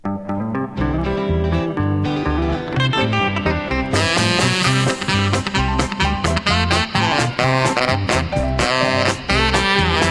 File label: gospel sax